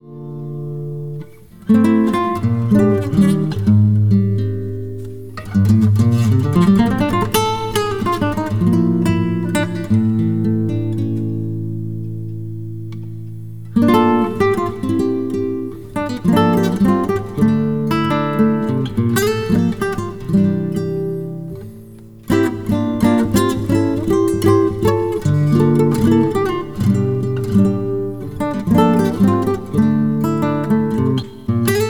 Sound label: instrumental